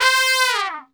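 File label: C3 trumpet, Brass Super Section; Best Service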